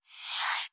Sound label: Soft Slide Medium